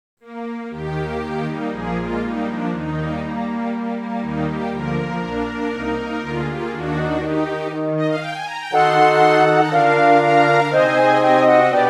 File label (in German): hornkonzert orchester; mozart